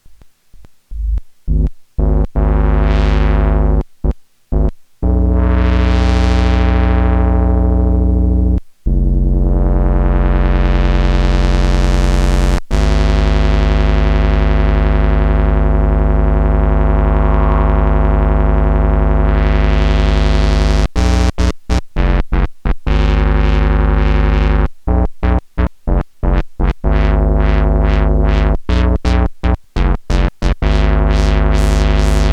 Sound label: korg monotron duo